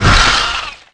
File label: houndeye die; gearbox